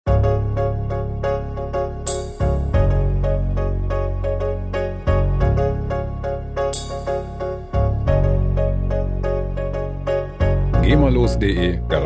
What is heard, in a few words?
fusion piano